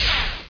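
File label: laser hit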